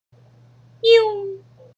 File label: nyoom